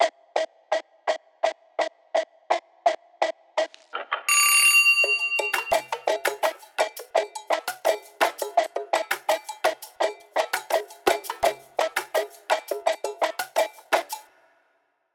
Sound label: Children A Happy Day